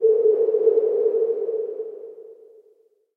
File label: magic ice